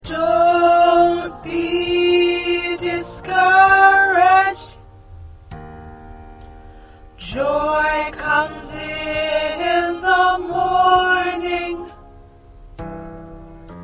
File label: Alto, choir parts